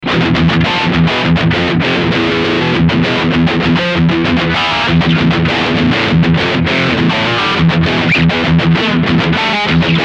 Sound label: Riff TSS Midrange Scoop